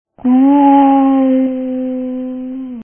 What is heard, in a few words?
cat; vapesquared